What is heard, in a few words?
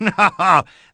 laugh; ash